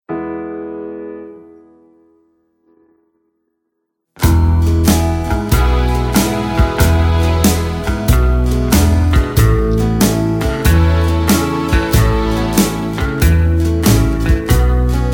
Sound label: (E) No Backing Vocals